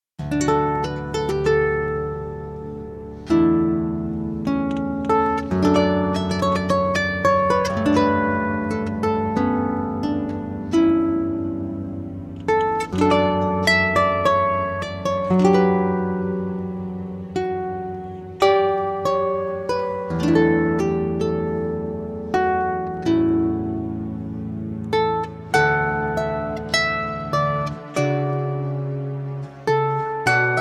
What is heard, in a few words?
oboe